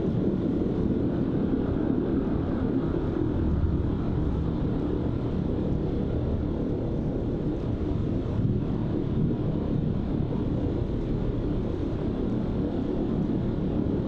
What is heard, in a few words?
ship titan idle; Stellaris; machineage